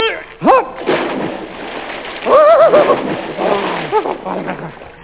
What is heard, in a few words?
SPLOSH; Psion WVE Audio